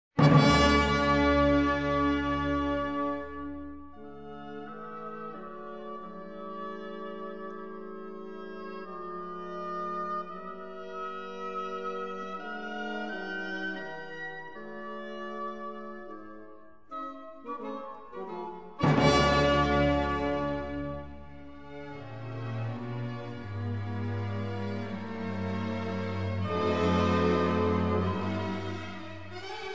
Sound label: symphony in d major adagio molto allegro con brio; beethoven; symphony 2; op 36